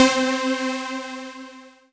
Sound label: Synths C; Vengeance Samples